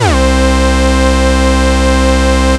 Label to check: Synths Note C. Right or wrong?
Right